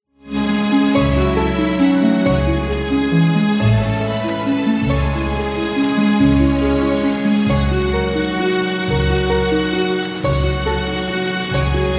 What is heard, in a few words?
guitar